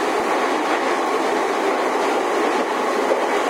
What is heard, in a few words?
E233 sub middle; tetsuon